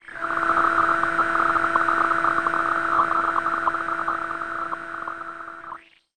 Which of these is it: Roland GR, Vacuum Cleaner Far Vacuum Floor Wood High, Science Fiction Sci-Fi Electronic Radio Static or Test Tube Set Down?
Science Fiction Sci-Fi Electronic Radio Static